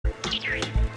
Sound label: hand link squeal